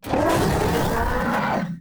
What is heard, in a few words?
turn; combat; creatures; cylarkan; she; CosmicRage